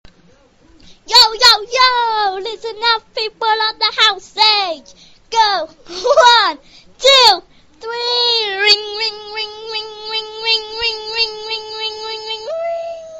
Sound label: Annoying Ringtone